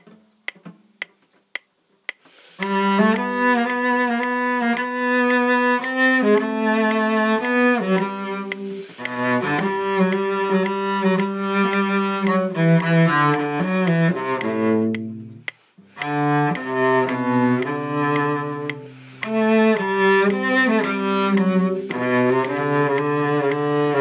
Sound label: Minuet in G cello